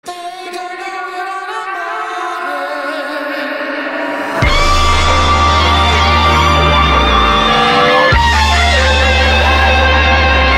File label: six string solo